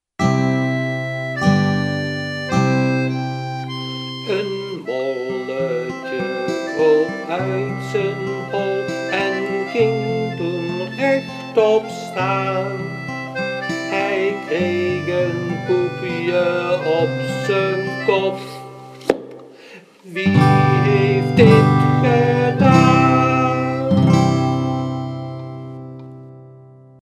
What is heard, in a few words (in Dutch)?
lied Een molletje melodie